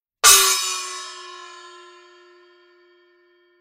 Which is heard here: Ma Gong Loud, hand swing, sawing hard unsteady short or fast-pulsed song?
Ma Gong Loud